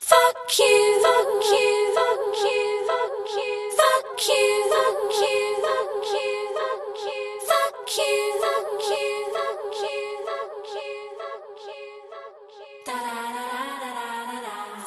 fuck you fuck you fuck you dadadadadada SUNG; Voice; expletives

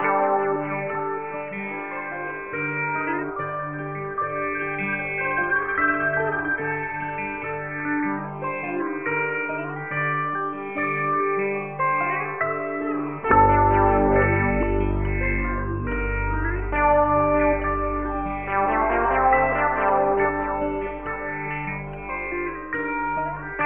guitar and keys funky sadness, Loops

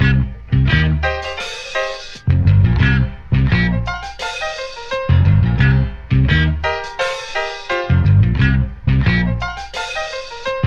piano bluesy guitars distorted, Phonky loops

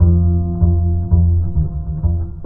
bass, Loops

